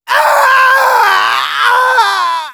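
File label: pain critical death, de; Scout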